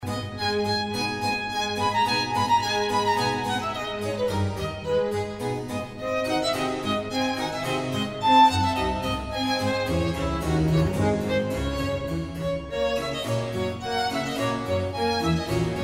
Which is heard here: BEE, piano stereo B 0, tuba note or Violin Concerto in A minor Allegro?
Violin Concerto in A minor Allegro